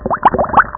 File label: Bubbles; Realmz 5.1.6